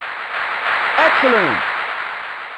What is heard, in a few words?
Applause (Fade In), Yamaha DD-35